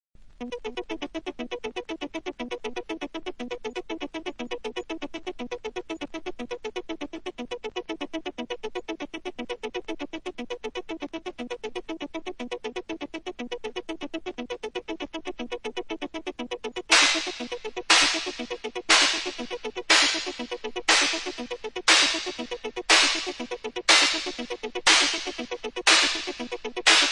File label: Instrumental